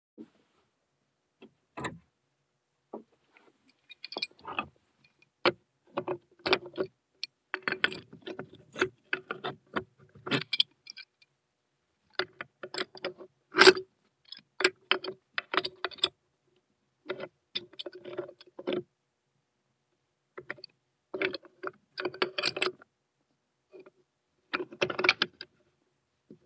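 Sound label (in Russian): wrench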